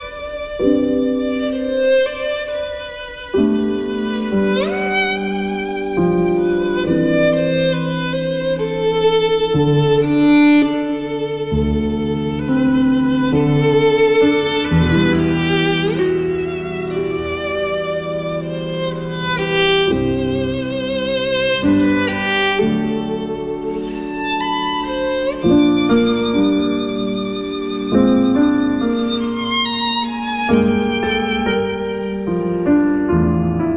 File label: piano; Akira Eguchi